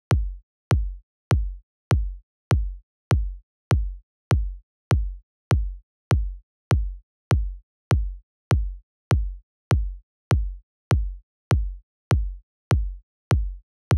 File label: Kick